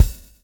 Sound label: swing